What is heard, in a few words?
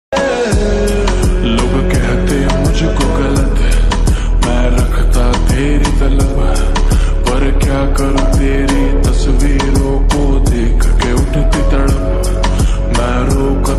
lofi